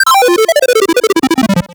Game Over; Warframe; Wyrmius Minigame

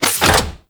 Gas City Small Door Close; Corpus

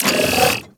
Servo Up; CTF Cephalon; Warframe; PVP; Ambience

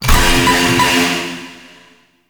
Vaults Vault Alarm On Off; Gameplay; Warframe; Entrati

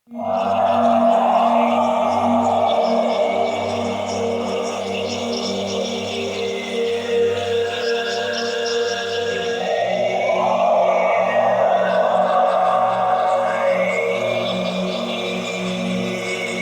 Sentient Choir; Sentients

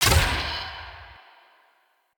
Bow Shot; Weapons; Player; Fey Thorn Bow

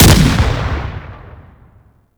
Dual Pistol Shot; Warframe; Grineer; Queen Guard

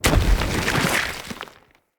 Root Spider Unburrow, Foley; Animals

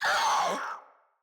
Infested Crawler Pain, enemies; Crawlers

